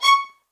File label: MED ATT C#, soft violins; Q Up Arts; Denny Jaeger Master Violin Library